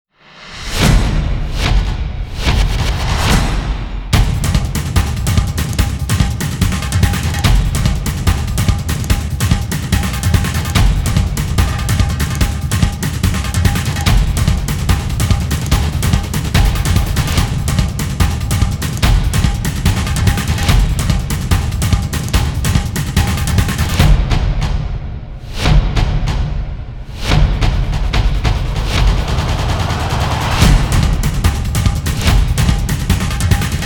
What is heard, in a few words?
speed drums